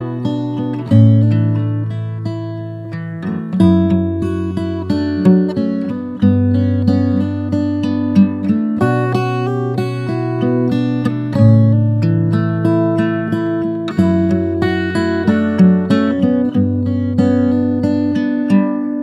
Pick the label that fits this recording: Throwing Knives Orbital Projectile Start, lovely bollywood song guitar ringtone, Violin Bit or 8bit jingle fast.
lovely bollywood song guitar ringtone